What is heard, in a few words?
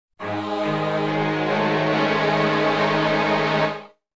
Combat Fanfare (16-bit); Wizardry 7 Gold; Windows; MacOS